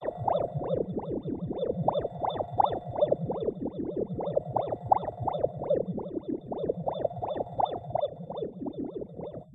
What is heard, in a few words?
Alien Alarm Clock Watery; Tardis